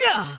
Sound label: spirit hurt; FlyForInsanity